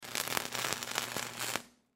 Arch Welder Spark, Arch Welder Crackle Short Electricity Spark; Machines; Industry; Sound Effects:Machines; Blastwave